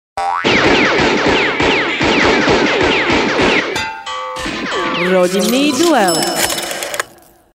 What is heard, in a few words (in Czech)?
jingel; rodinnyduel